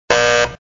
Buzzer; moonstone madness

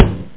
balloon bust; Amiga Games Extra 1996 #6; harrysballoons; sonix

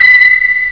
coin; quizmaster

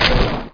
bod strk, bugs; Starship Troopers

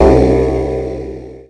menu; Messiah; INTERFACE

